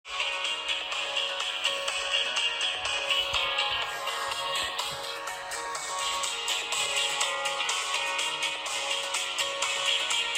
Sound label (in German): amazfit gtr 4 lautsprecher